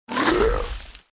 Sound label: Monster dead